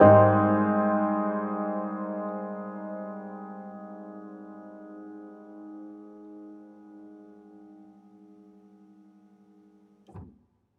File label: piano chord maj7 Abmaj